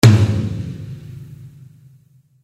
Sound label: drum